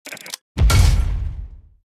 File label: Skill Support Fire; starbites